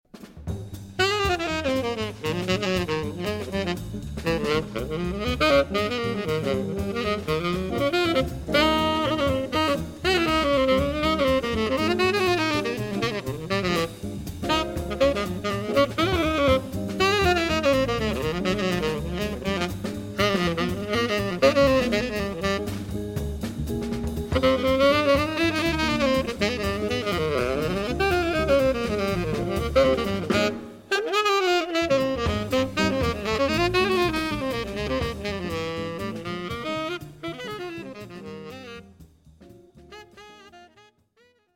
Tenor Saxophone Jazz Quartet; se electronics rn17 stereo pair; میکروفون استودیویی و لامپی اس ای الکترونیکس RN17 Stereo Pair

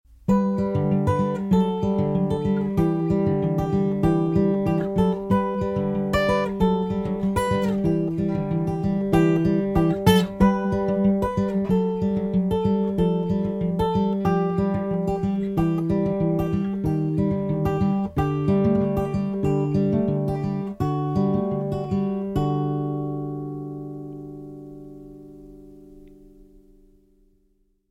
Acoustic Guitar Picking High

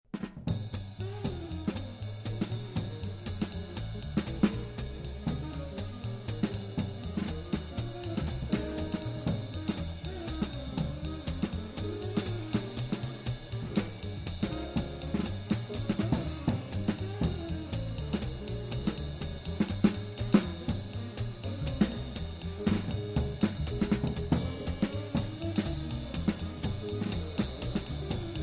Stereo Pair Drum OHs Jazz Quartet; se electronics rn17 stereo pair; میکروفون استودیویی و لامپی اس ای الکترونیکس RN17 Stereo Pair

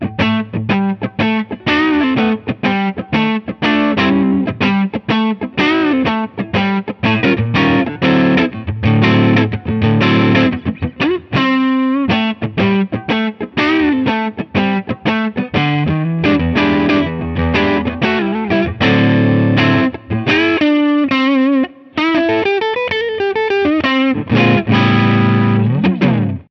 Blues Amp

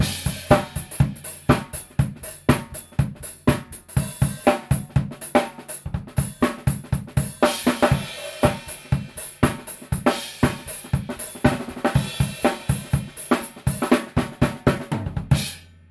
drum room mics

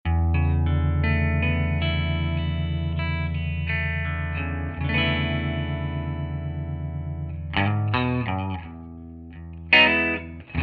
’64 Black Lux Norm with 1x12 Black Panel Lux; River Roll; Dyn 409

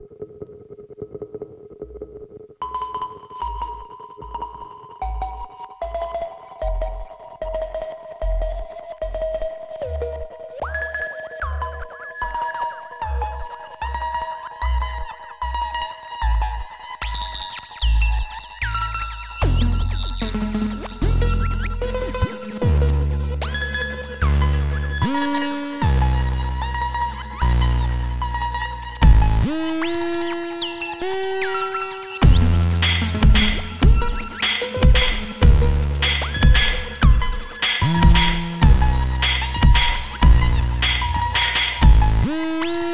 Arturia Minibrute 2 Mix

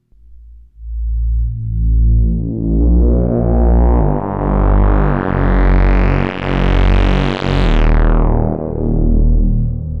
Grandmother Moog; Cosmic Inflation

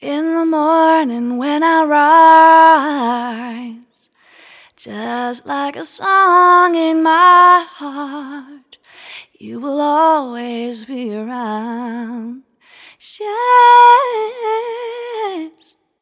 Ballad Female Vocal; se electronics z5600a ii

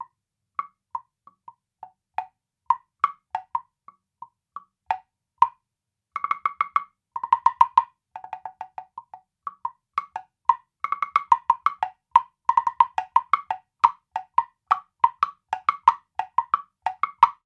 Woodblock

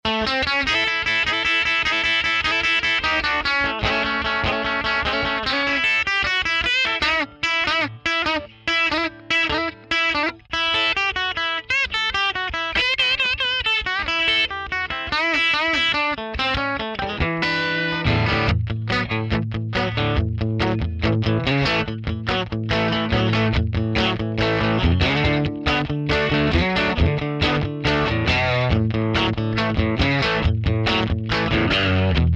Tweed Bass with 4x10 Tweed Bass; John B Great 59; Ribbon 121

